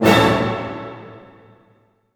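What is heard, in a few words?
A major fortissimo, orchestra hits; Sound On Sound - Peter Siedleczek's Orchestra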